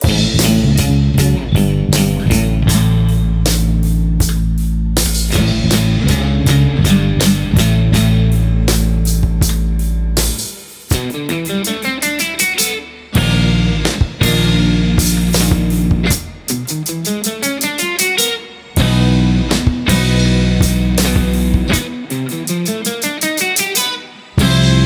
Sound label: SSL Fusion Violet EQ 20 kHz 6 dB